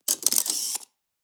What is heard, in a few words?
equip sound